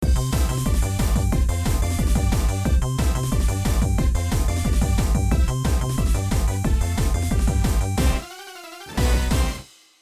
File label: Remix Tempo Up